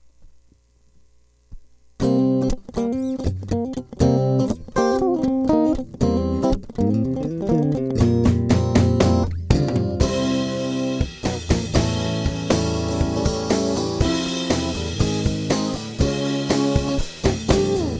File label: intro without drums